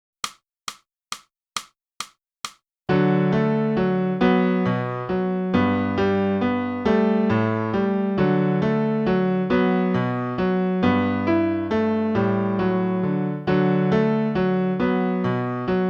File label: flute; (Morgan) - Boosey Woodwind Method: Flute (Book 2) (Book & CD)